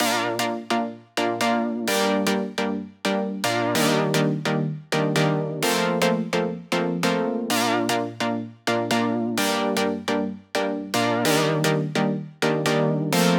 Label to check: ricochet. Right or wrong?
Wrong